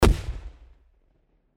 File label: explosive ambience; cortex